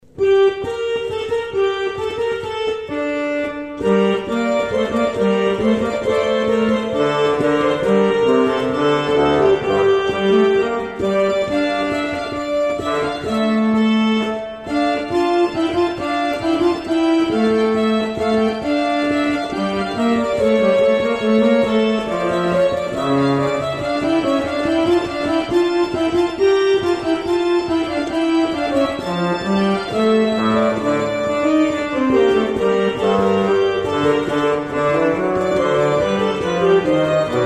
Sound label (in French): Orgue Duo des trompettes; Orgue Cordelier; orgue eglise cordeliers